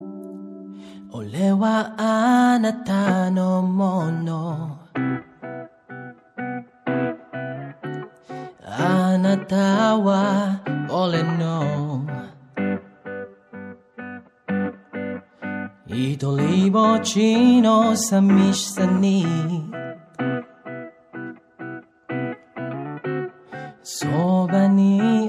japanese ringtone

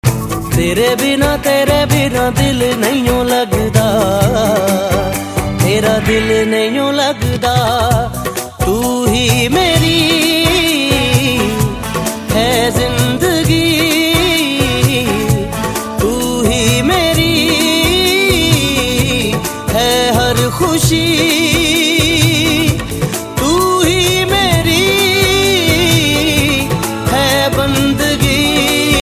male ringtone

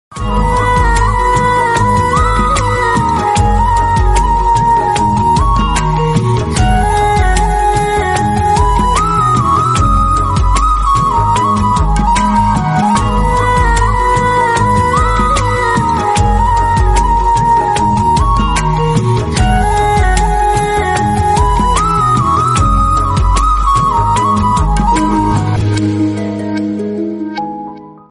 instrumental ringtone

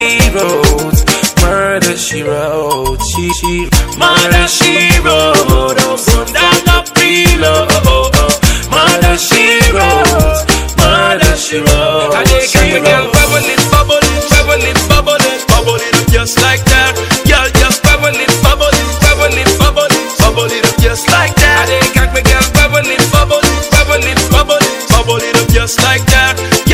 remix

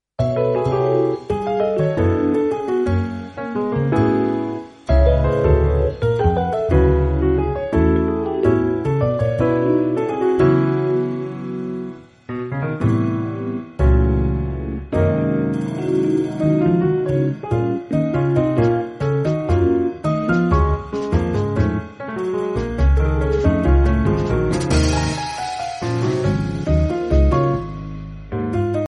jazz

